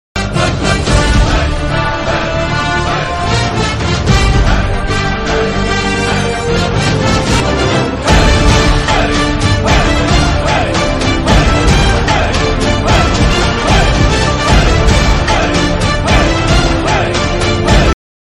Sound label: bgm; petta